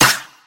Sizzle Acoustic Snare F# Key, steel snare drum sound tuned to the F# note